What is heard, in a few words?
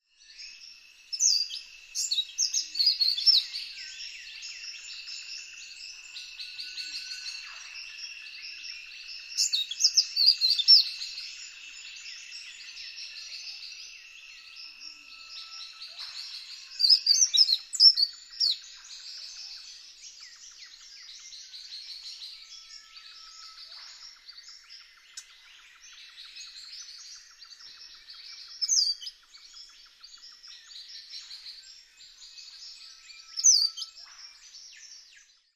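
Yellow throated scrubwren, bird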